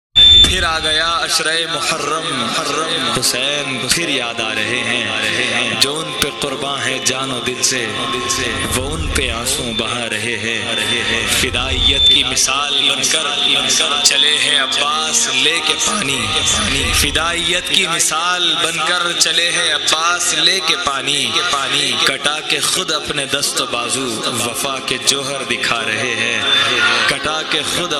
Islamic

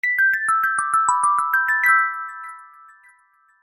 Message Tone; Samsung

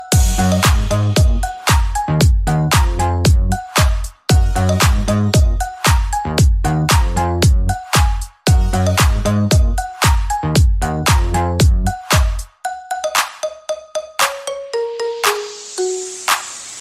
iPhone 11 max Rock

